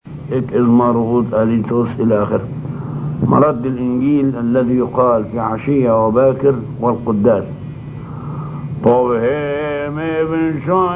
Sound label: Gospel Response; Alhan Shohada; Martyrs Kosman w Damain